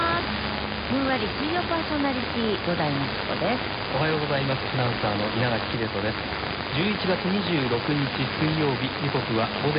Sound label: NHK1 594kHz